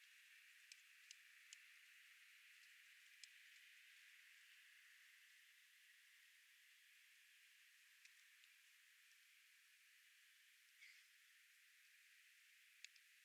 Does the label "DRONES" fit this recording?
Yes